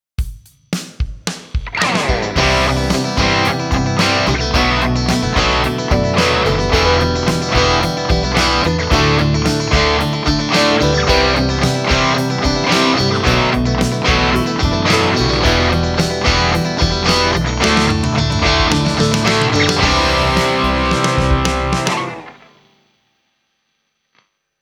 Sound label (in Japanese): リミッター; boss gx1